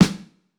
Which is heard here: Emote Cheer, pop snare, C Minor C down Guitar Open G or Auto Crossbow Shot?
pop snare